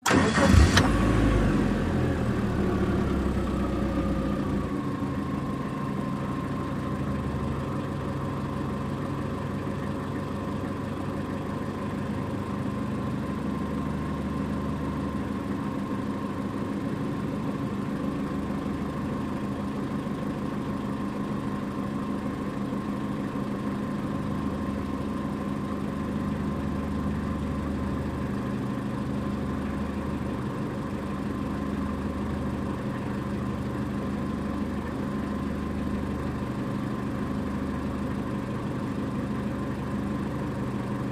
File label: Sm Car Eng Idle Off, compact